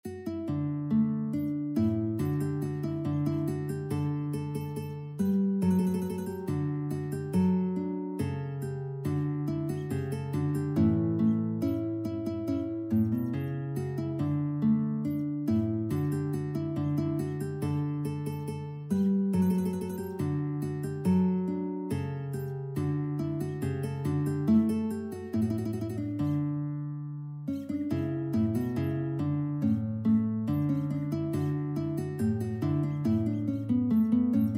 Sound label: guitar